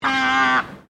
Birds Ibis Australian White Mature Honk; Birds from South Australia; Animals

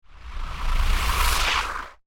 Tail Of The Dragon Monster; fantasy

